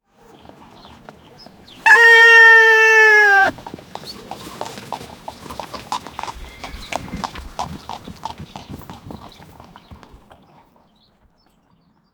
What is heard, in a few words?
a horse neighing; animal